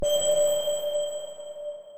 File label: Scan enemy